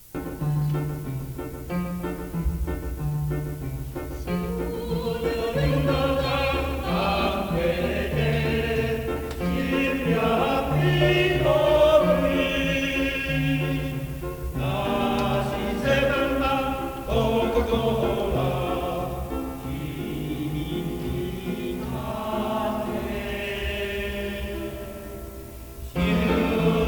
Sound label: Tenor; F-Chorus